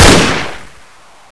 fire; weapons; sti-eagle

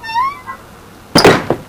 shutters wood close

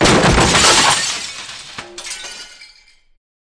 bust metal

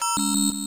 bip; sven; sobrobo

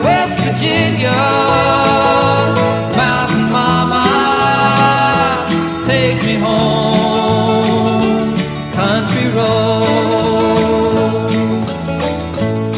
country

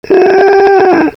"ahhh"

